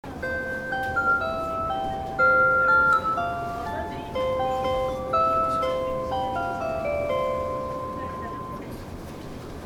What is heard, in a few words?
chime; odakyudentetsu